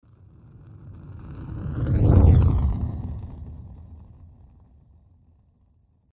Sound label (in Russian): kometa proletela